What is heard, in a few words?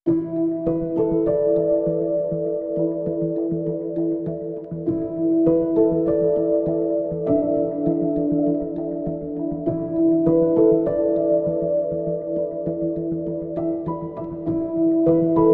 Soft Landings